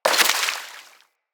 Bullet Impact Debris Water; Weapons

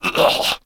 Grineer Male Death Gurgle; Kubrow Feral; Warframe

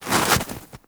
Armadillo Move Snow; Warframe; Characters; Hunting Critters

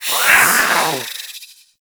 Catbrow Feral Vocal Attack Medium; Enemies; Warframe

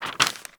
Putdown Paper; Warframe; Cephalon Weave

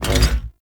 Bash Cast; Warframe; Hoplite

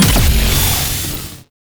Guardian Shield Activate; Warframe; Eximus

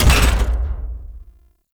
Floor Panel Stop; Ambience; Warframe; Entrati; Dead End Secret

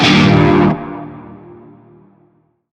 Shawzin Min Chord; Warframe; Narmer Shawzin

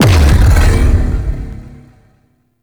Strength Ability Cast Hit; Warframe; Warframes; Helminth Abilities

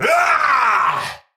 Attack Effort, Battle; Warframe; Tau; Dax; Knight

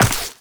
Step Ice; Footsteps; Walk; Warframe; Tenno

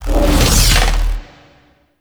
Boss Teleport Start; Warframe; Cephalon Weave

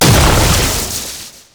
Stalker Jade Scythe Proj Die; Warframe; Weapons

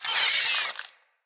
root spider vocal death; Animals; Spiders; Vocals

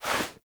Speargun Throw Release Foley; Warframe; Weapons

